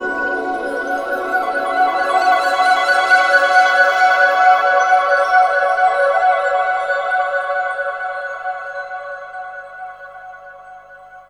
Banco De Fry Up C, Pads; Total Drum&Bass; 90 s Sample CDs

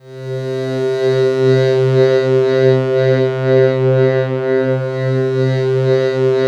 pad, 160 BPM, Drum & Bass; Ueberschall; Drum & Bass Flash Trackz

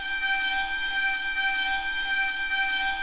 waterphone, percussion

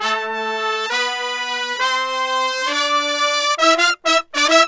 100, BRASS SAMBA

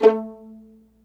A3 PIZZ, Violin; Q Up Arts; Denny Jaeger Master Violin Library; PERFORMANCE

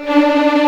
D4 TREM, VLNS TREMOLO, String; East West; The Ultimate String Collection

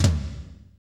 tom, fast shuffle, Roland

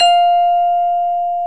VIBES BELLS

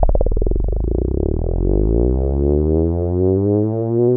BASS, Drum & Bass, 170 BPM; Ueberschall; Drum & Bass Flash Trackz

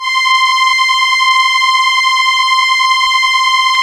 STR ELKA, Elka Strings, Elka Violins

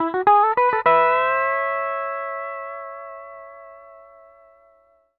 105 L, PD LOOPS

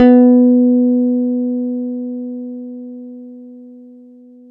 PX PICK B 3, Bass; Masterbits CLIMAX 7; PX BASSES

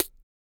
SNARE, Male Perc, Vocal; Spectrasonics Vocal Planet; Jazz FX

